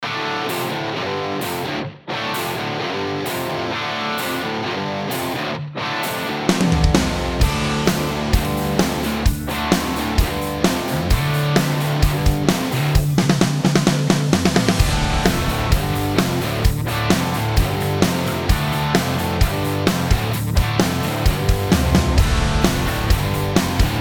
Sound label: Ludwig 5x14 Acrolite Aluminum Snare Drum